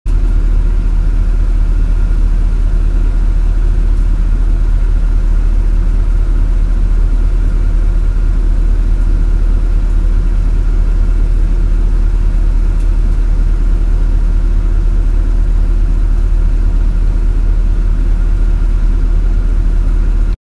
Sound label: 10 feet away on right side, porsche cayenne turbo s